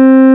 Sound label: BASS C4; Big Fish Audio; Synth City